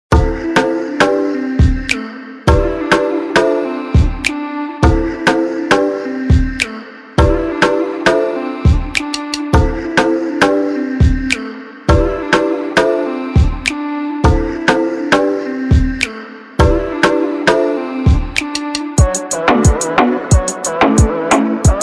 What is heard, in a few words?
Reggaeton Drum Samples, Drum Loops One Shot Samples for Reggaeton